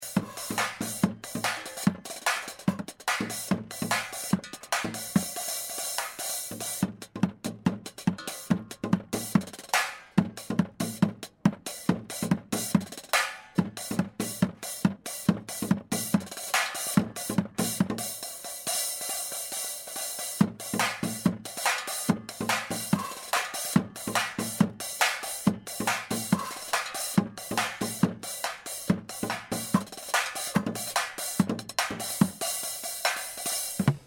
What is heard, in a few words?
drummer